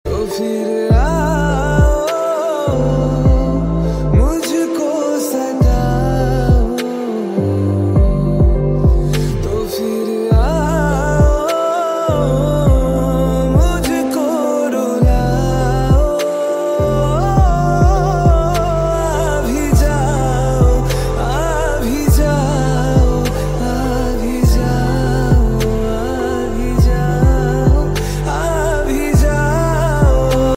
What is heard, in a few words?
Ringtone